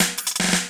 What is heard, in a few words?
LOOP SD; Ueberschall; Drum & Bass Resonance